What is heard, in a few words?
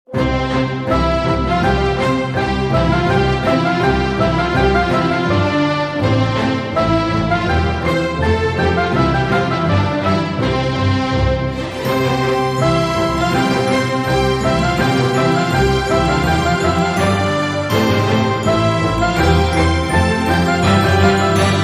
No Jingle Bells